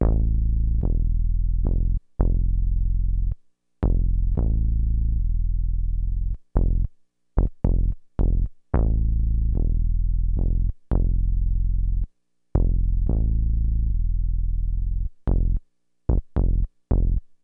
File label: BAS, Hip Hop and R&B; Best Service ProSamples vol.02; AKAI